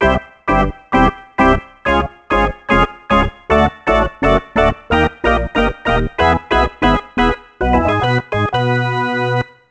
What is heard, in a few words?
Ditty; NBA ShootOut 2002; PS1